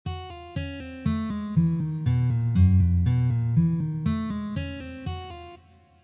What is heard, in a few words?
Pull Off